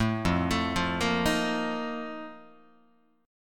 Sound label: F 11th F, down, Guitar; F11 arpeggiated